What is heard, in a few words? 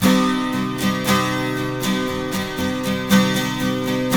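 12 string minor C#, guitars; Spectrasonics; Hans Zimmer Guitars VOL-1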